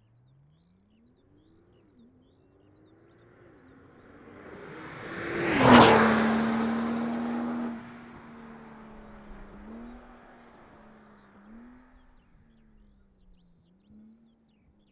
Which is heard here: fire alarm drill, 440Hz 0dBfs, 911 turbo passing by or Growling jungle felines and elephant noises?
911 turbo passing by